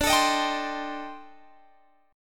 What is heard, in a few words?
A-Minor 7th Flat 5th D, chord; Am7b5/D; strummed